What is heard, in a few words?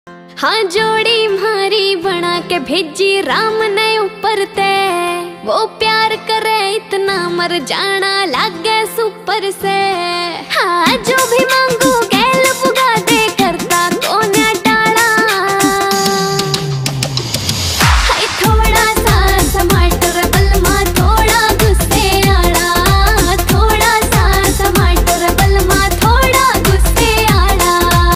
haryanvi ringtone